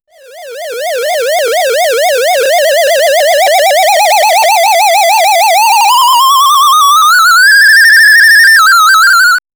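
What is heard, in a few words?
chiptune sfx damage received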